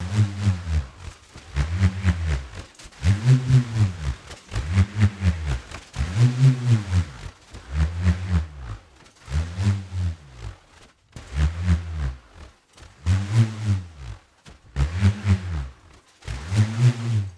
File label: didgeridoo; caringa